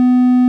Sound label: beep flat